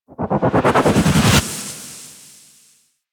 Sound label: Void Arena Ghost Comet Proj; Warframe; Quests; Wraith Quest